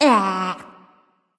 tongue; smite; amaterasu

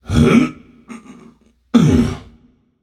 nv grunt lift; cernunnos

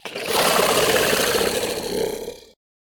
scorpion incap vox; smite; npc

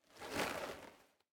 armor; Smite; god Kuzenbo v2

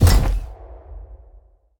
giant run; smite; vamana

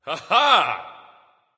"ah", discover; smite; voicepack; ullr

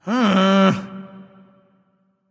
"ugh"; smite; voicepack; ullr

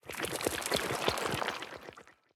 step; smite; poseidon

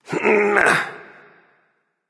grunt lift; Smite; baronsamedi

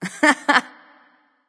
laugh; smite

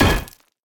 axe groundimpact; Smite; Sobek

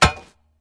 axe ground; smite; chaac

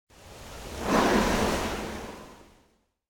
Idle Wind; Smite; Susano

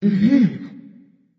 tongue; smite; serqet

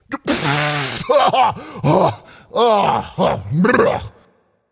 clear throat; smite; voicepack; khepri

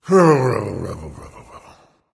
grumbling; smite; voicepack; ymir

